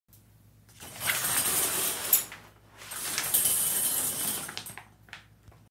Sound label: Curtain, کشیدن پرده